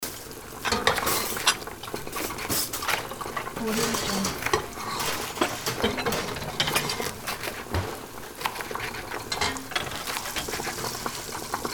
restaurant kitchen ambience

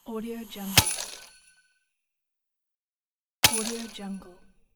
frost magic ice bolt spell impact

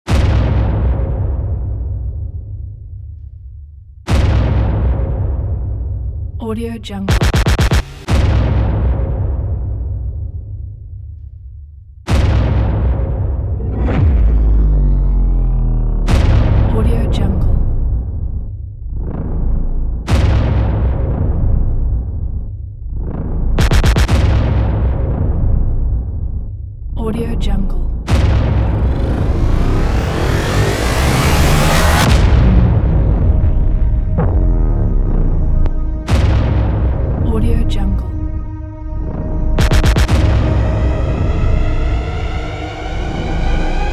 suspense promo trailer

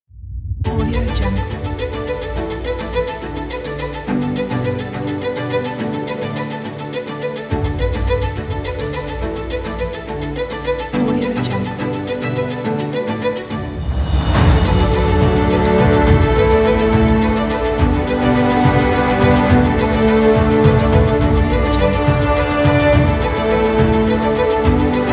inspiring celebratory orchestral

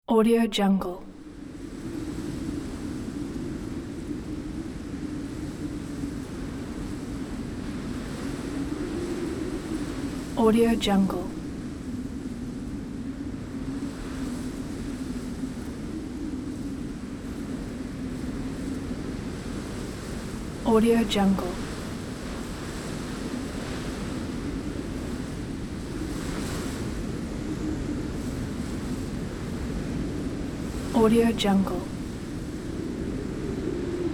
the wind

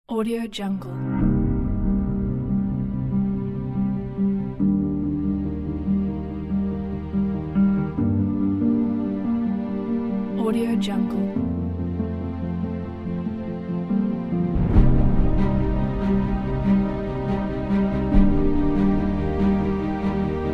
dramatic epic

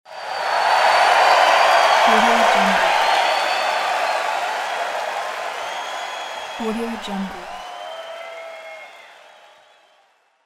crowd cheering

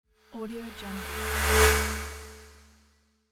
tonal swoosh

